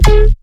BASS ONE SHOT MELLOW

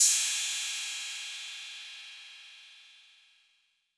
808 Cym, Roland MV-8008; Roland MV8800

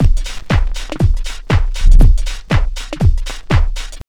house loop

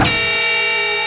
Beep

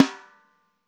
LOFI, LO-FI KITS; Spectrasonics; Burning Grooves CD2

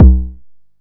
Bass Drum, Jomox Xbase-09; Drums

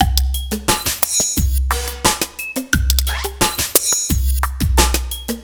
88 CAT LP 6, Industrial Loops

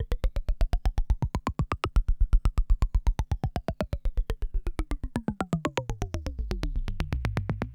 ELECTRO; AMG; Global Trance Mission VOL-1

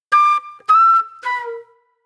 Sparse Flute; Sonic Foundry (Sony Creative Software); Manhattan Flutes; Garage Funk

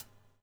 BUBL, Backbeat; Spectrasonics - Backbeat

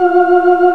Organ, Barton Tibias

